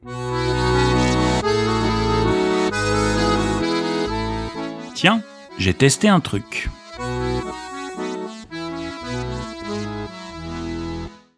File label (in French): jingle; podcast; tiens un truc